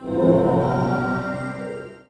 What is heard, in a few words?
inner fire birth; RPG Maker; Legend of Heroen v1.20